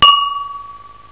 klirr

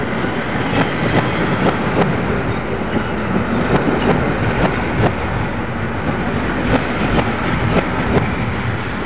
ZUG FAHR

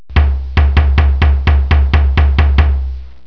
nageln

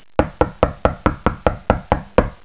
klopfen

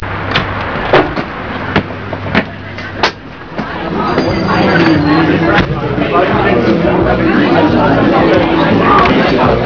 Kneipe

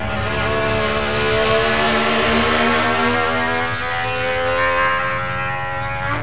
motorrad

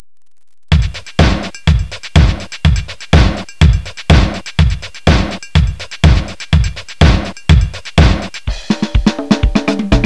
Tanz End

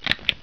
KLAMMERN

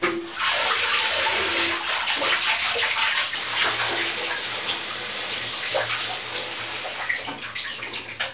DUSCH

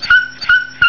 Hund

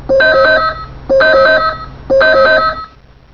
TELEFON

